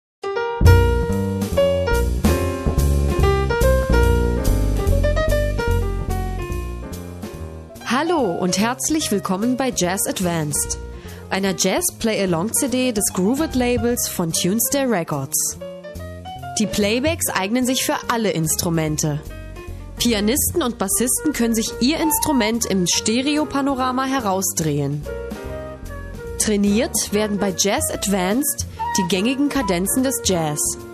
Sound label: trailer jazz advanced